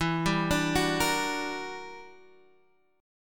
E Minor 7th Sharp 11th; arpeggiated; Em7#11

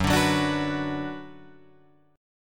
Gb Suspended 4th Sharp 5th, Guitar, Open F; strummed

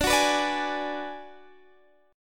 D 6th Flat 5th D down Guitar DADGAD, chord

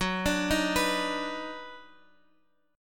D sharp Minor Major 7th Sharp 5th F sharp

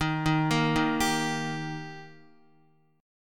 Eb Major Eb down Guitar 4F,4A,5C,5G,5C,6E; arpeggiated; JGuitar

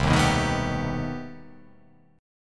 C Minor Major 13th, chord

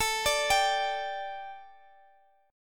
A 7th Suspended 4th A, Guitar DADGAD; chord

